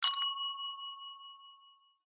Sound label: Call Bell Tap Style